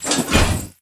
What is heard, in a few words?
Cage Appears; Peace, Death